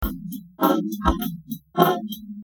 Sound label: morph drum